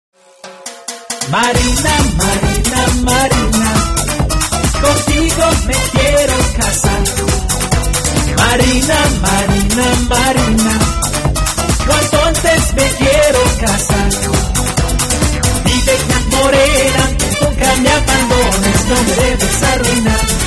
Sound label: Spanish Version